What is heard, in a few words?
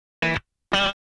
Guitar, RnB